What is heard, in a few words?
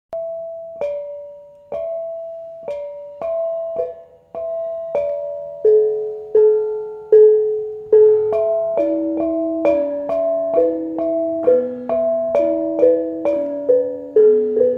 pangkur example bonang barung